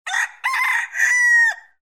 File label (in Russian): zvonko vygovarivaet petuh